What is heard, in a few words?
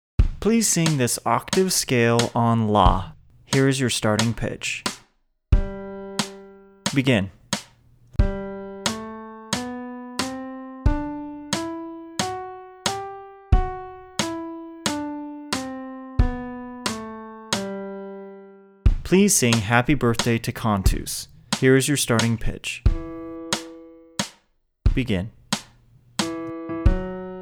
Male High Voice Tenor; General Audition